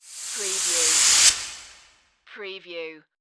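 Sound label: Production Element Reverse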